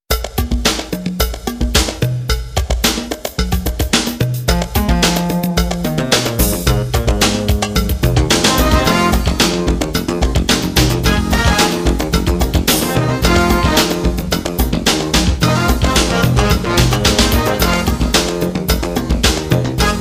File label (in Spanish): Gran Salto